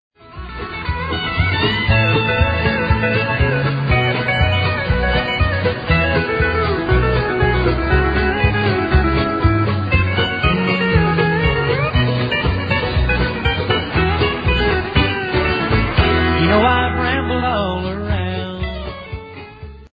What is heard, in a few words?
blues